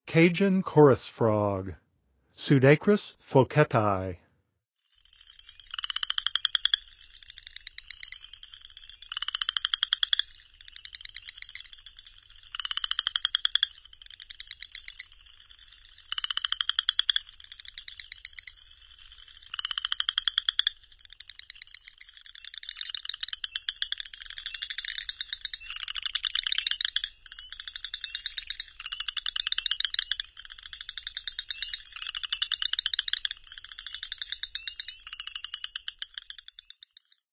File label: Cajun Chorus Frog; N. America; Songs of Frogs & Toads of North America